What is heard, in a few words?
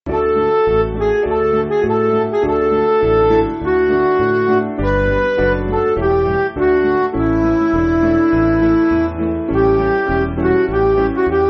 Midi